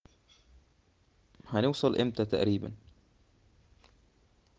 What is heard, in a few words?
traffic